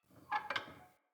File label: Tent Fire Pot Metal Creak; Soul Plane